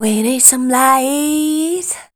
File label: voice spectral, ly short; Best Service; Voice Spectral II